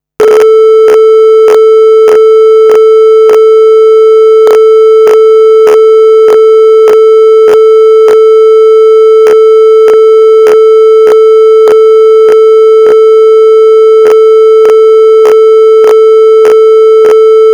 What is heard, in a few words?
test 440; Volumio2; pi3b+; lan usb